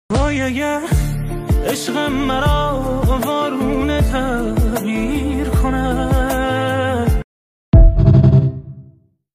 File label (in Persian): music, آهنگ کوتاه غمگین ایرانی